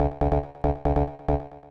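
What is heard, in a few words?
synth electro 140 A; Techno; Keys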